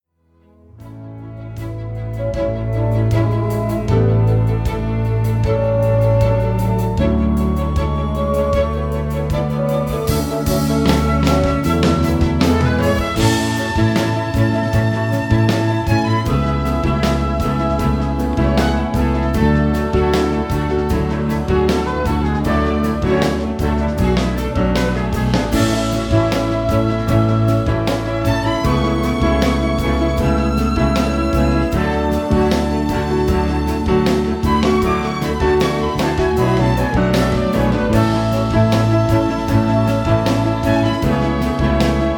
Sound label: Instrumental